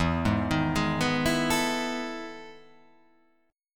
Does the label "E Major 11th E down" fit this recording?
Yes